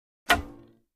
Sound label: Bow Release Twang, bow & arrow